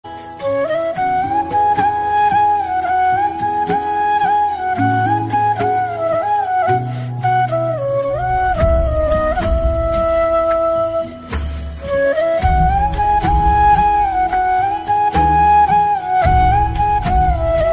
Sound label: flute ringtone